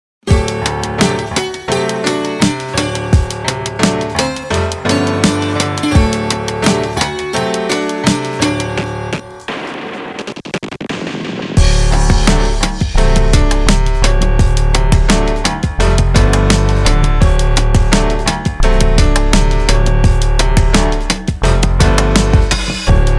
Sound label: Jazz Hip hop